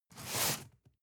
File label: cardboard box medium slide short; objekte